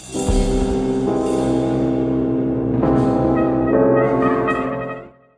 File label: jazz mania, guitars